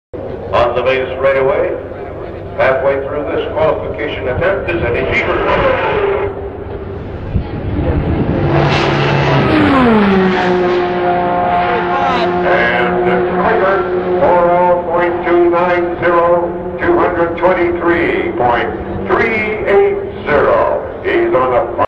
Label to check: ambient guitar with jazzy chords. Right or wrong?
Wrong